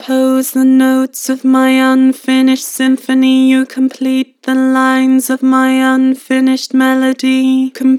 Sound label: Vocals Flat Compose the notes; Harmor